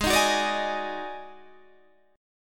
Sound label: Eb Minor Major 7th Double Flat 5th